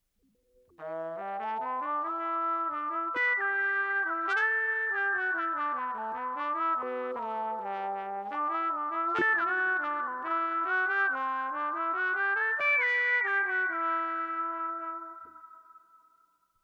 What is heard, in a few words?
Synth, Flute; Pitch Manipulation Pedals